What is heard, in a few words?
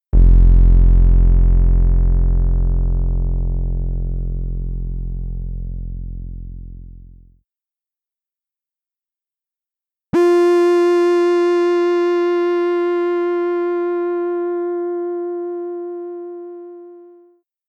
Low High Pitch